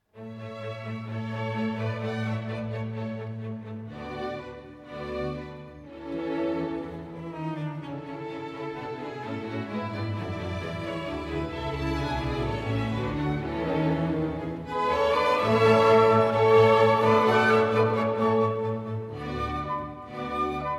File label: clarinet concerto in a major allegro